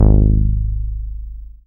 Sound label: BAS MKS-50, Roland; Sound & Vision - Gigapack II CD 1